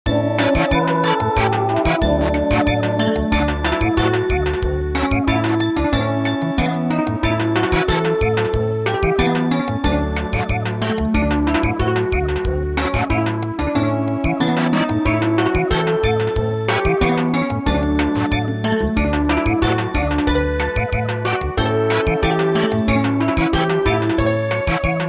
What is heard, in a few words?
Samba, midi; Abschied Von Den Bergen